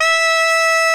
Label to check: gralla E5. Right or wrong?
Right